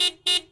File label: car horn